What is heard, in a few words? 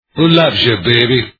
audiologo; savalas tv